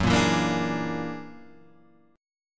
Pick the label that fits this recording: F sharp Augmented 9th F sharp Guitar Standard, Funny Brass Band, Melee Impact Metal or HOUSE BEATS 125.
F sharp Augmented 9th F sharp Guitar Standard